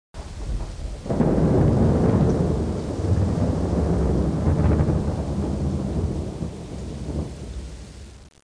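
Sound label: storm, weather; ambient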